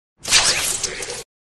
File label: web throw